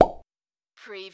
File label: CARTOON POP